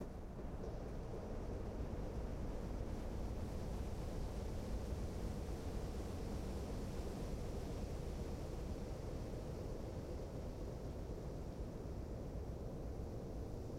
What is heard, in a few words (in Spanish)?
Semi Desierto